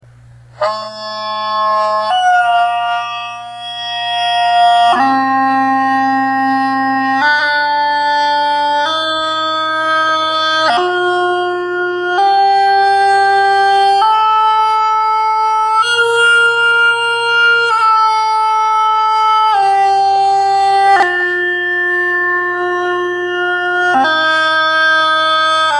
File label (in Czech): dudy stupnice